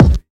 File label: KICK RUGGED; Percussion Samples; Drumkit; Kicks